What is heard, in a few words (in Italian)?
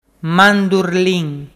mandurlin